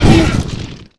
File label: Golem Hit; Knight Online